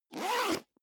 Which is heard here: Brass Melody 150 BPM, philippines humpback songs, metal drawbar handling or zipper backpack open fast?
zipper backpack open fast